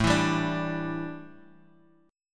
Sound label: A 7th Suspended 4th, A, down